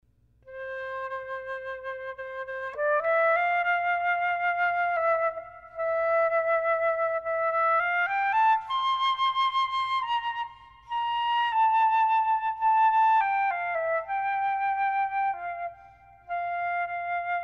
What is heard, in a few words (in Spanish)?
Flautas; Amor, que por amor desceste, Jesus me Guia